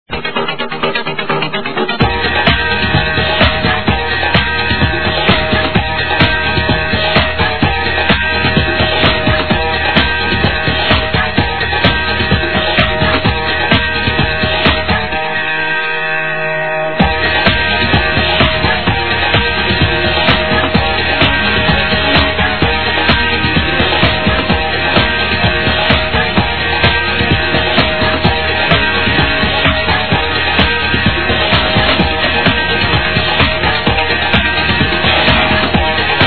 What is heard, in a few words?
great early progressive guitar riff get used to it vocal sample